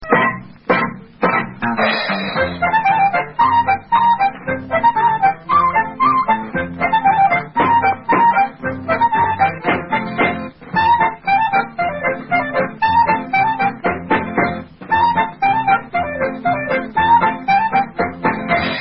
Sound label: Hip Hip Polka American Instrumental